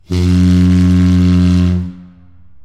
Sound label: Russian Tyfon